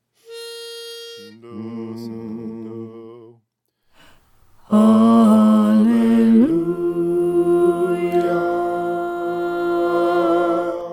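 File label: soprano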